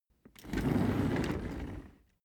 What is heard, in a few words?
office chair trundle fast long